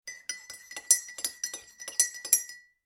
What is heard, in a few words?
Stirring Cup/Mug Of Tea Or Coffee; Kitchen; Household; food drink; McKinney Sound